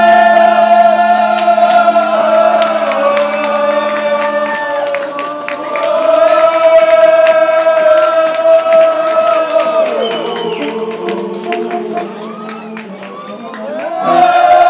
an impromptu horn version, brass; french brass players

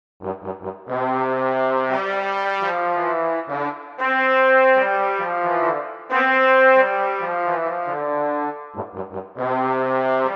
star wars with backing track

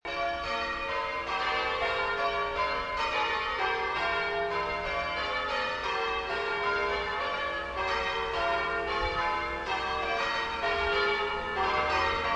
bells at trinity church on a friday night; llandudno

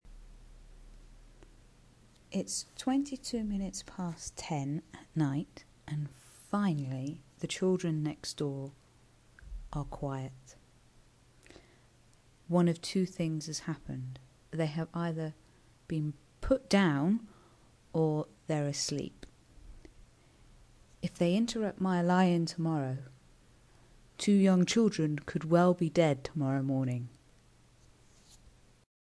the annoying visiting children next door

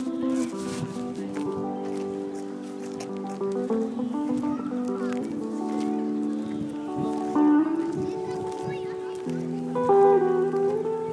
guitar at the river spree

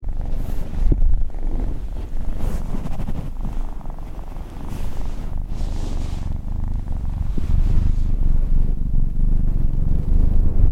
Sophie has a good purr, kitten

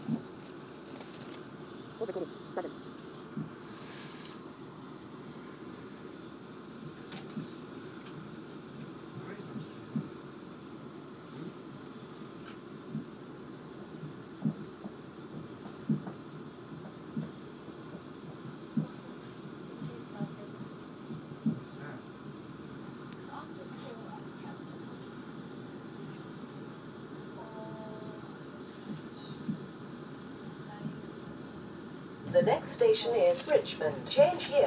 random train

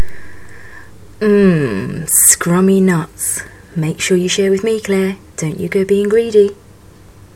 mmmmwah; yummy nuts

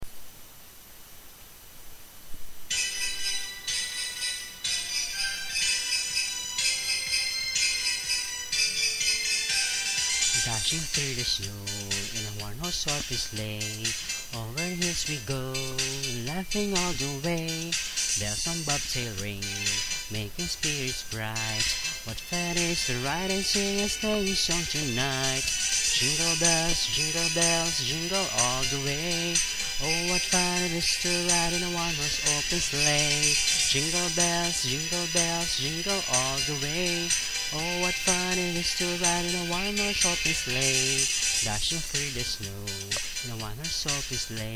jingle bells

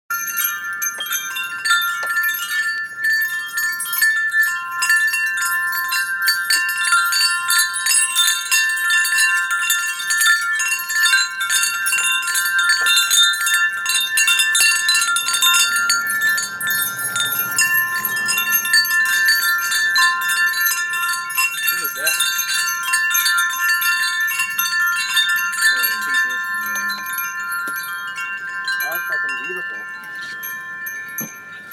wind chimes

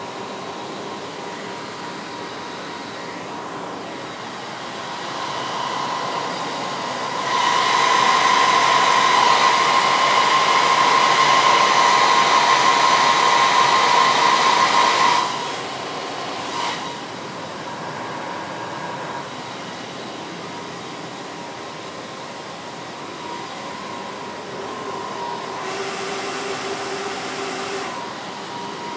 sounds of a machine room

